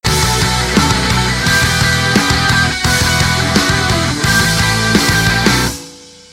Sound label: outtro music